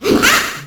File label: ha